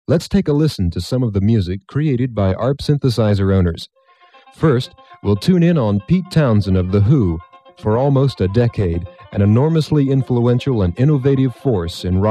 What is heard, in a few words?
ARP; ARP 2600 Demo Tape - Townsend